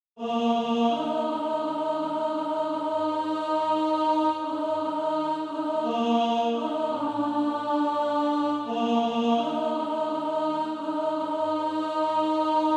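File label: alto, Practice Music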